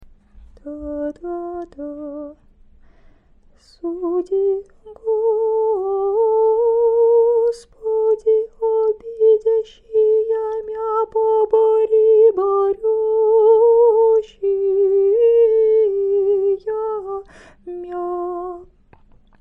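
great friday evening prokeimenon g soprano, singing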